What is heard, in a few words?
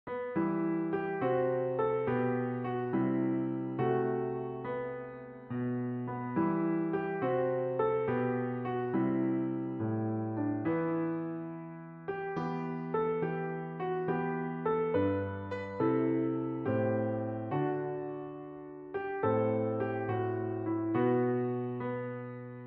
keyboard; gabriels message